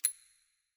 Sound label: ui common notification